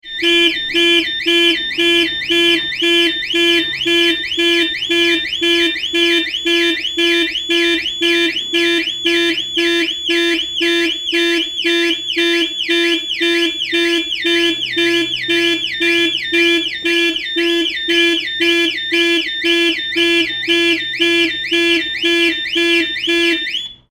Car Alarm, Car Alarm - Set off - hooter with bleeping; Electronics; Tom Hutchings